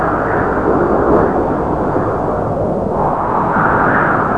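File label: wind light; The Elder Scrolls III - Morrowind; Morrowind GOTY GOG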